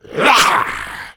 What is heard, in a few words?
growl